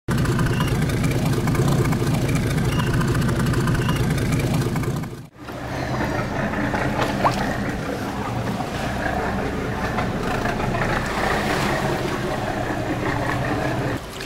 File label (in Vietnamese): tong hop am thanh tau thuyen chay hoat dong